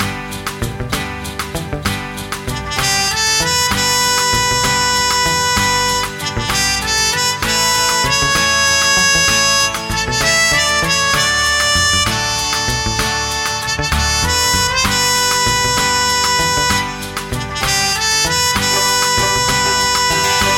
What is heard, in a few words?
GuitarMusic